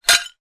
glass thick pieces down hard ground together; impacts

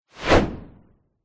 whoosh fast; sound design

